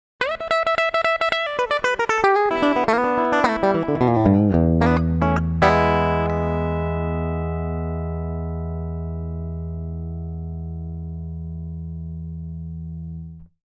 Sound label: tele lick